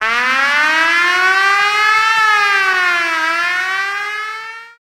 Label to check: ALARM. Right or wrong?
Right